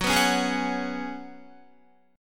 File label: F sharp Suspended 2nd Suspended 4th, Guitar; strummed; JGuitar